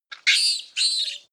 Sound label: monkey vervet screech